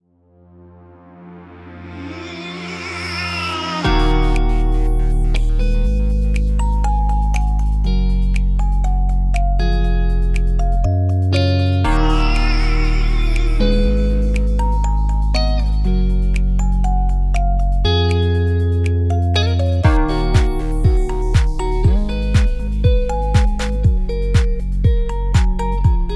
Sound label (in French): French Pop; Le Corbeau d'Argent Production